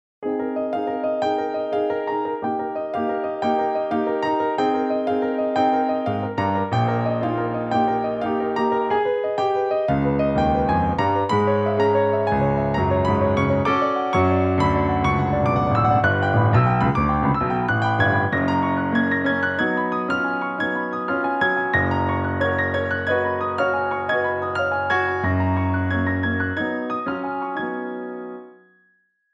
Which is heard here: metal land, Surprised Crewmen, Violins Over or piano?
piano